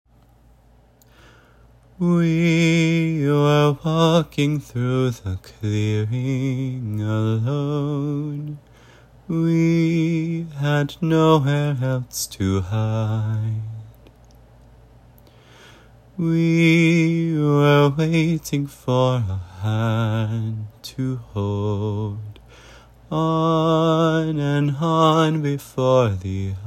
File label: Vocals; D&D Music